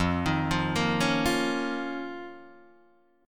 F 7th Suspended 4th Sharp 5th F down Guitar Open D